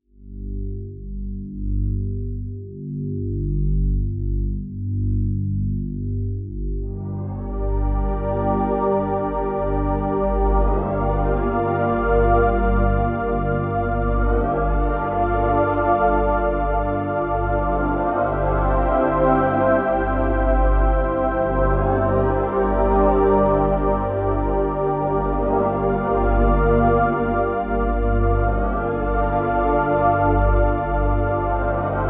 Melancholic drone

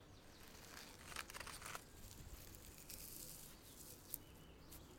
Crushing Dead Flowers

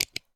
Click

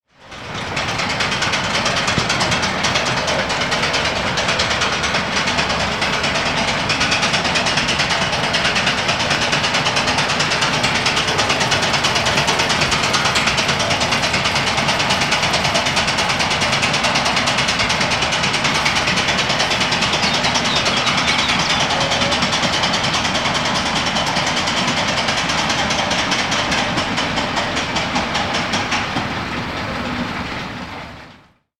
Industrial Noise

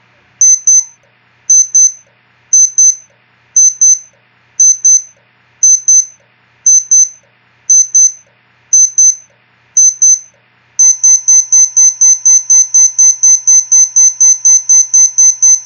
Timex Expedition Watch Alarm

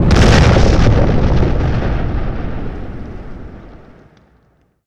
Big Explosion

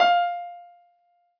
Piano Ff

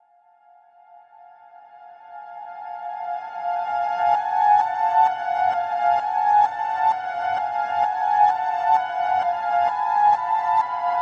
Nightmare Piano Loop

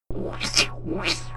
Bug

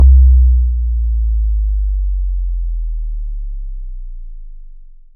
Beast Bass Drop

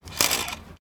Bus Ticket Validate

